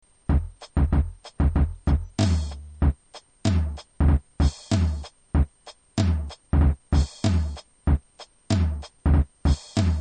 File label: Drum